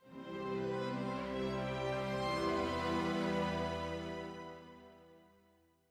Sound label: Stinger Electionresult Negative